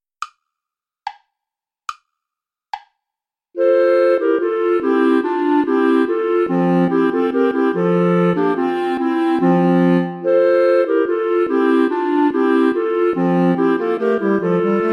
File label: play along without clarinet